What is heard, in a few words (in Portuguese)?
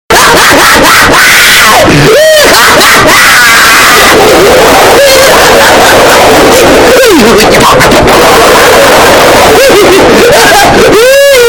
risada; Kiko